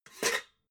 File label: pots clank soft